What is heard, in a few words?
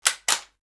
gun reload